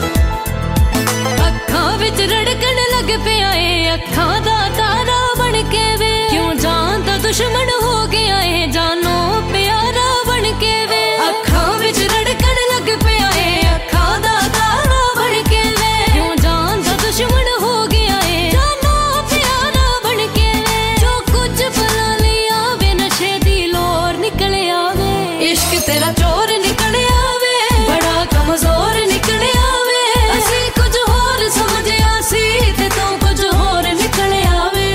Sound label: Sad Punjabi Song Ringtone